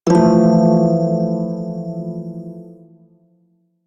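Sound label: Prime Shawzin Major Chord F; Music; Warframe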